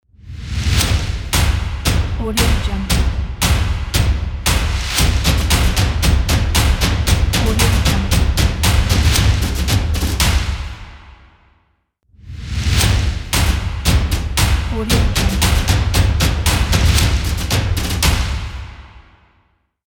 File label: drums action sports logo